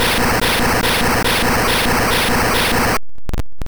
Earthquake; Pokemon; Gen 2; attack moves; GSC

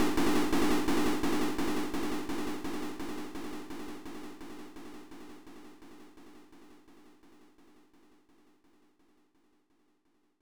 Rock Fall Danger; Pooyan; Family Computer

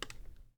Cassette Touch; This Is the Police

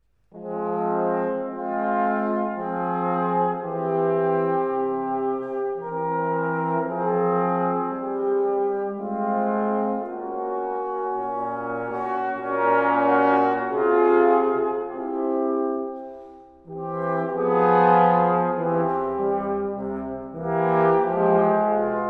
Equale in F Minor

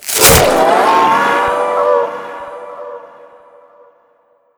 Impostor Transforming; Among Us

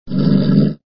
Voices sound (growl)

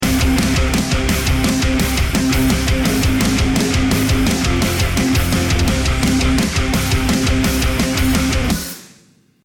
start action

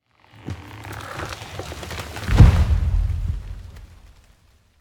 Dino Footstep; Angry Birds

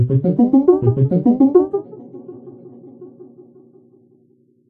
Trip Arp; And Yet It Moves demo

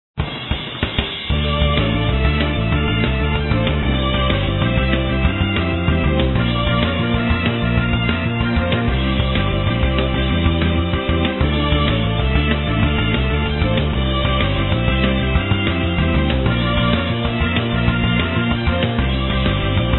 battle sound; Monster Arena

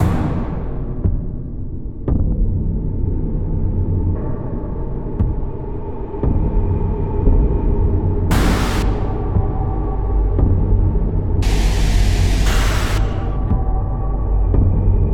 Music Ruin Main

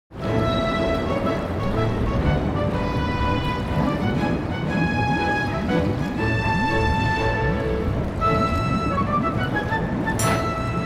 Duet; Sly Cooper 3 Honor Among Thieves; Duet with Octavio

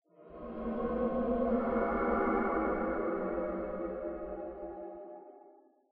Diving Music Texture

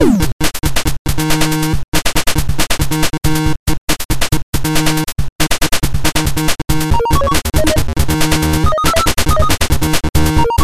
retro factory 32khz music; super meat boy

